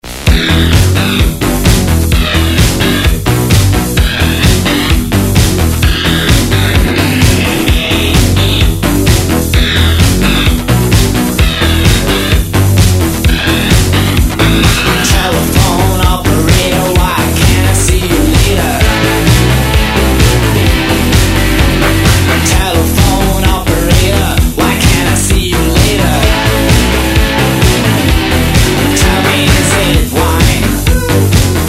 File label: Disco